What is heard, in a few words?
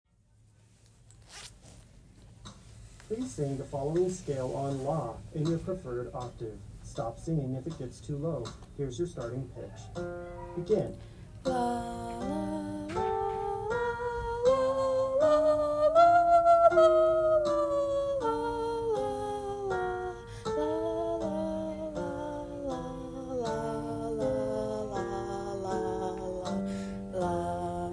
Audition soprano